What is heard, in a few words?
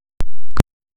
enabling sound on a phone, system